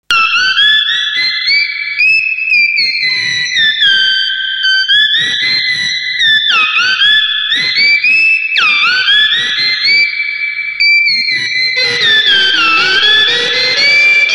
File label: KORG GUITAR SYNTHSIZER